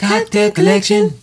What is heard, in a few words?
sung, Vocal Lix; Kocktail K Spoken